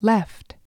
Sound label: Left, channels; test-card